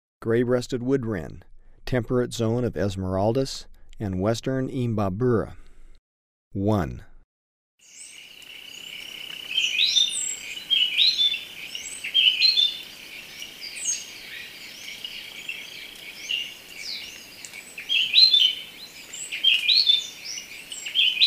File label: Gray-breasted Wood-Wren (temperate), Birds of Ecuador; S. America